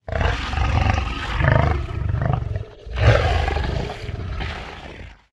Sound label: idle, bloodsucker